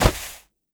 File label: sand; Rust